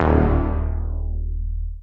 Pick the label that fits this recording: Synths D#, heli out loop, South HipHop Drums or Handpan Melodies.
Synths D#